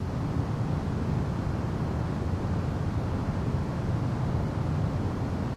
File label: deep noise; DELTARUNE